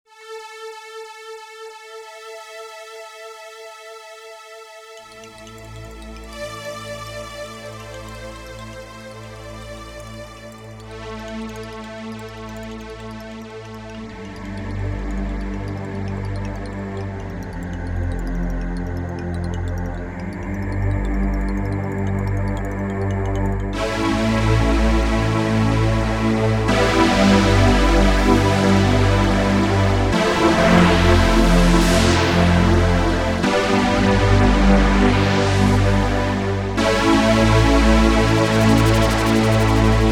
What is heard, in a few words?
yamaha tx16w sounds new age